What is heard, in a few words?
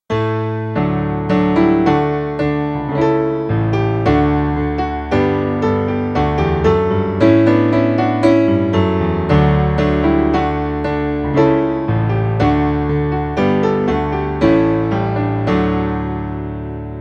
te deum piano